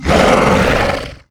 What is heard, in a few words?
roar short; tf